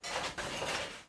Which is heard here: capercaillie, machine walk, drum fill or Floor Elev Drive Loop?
machine walk